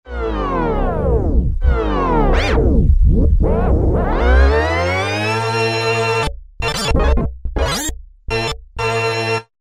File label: Tape Rewinder, Roland V-Synth; Factory Patches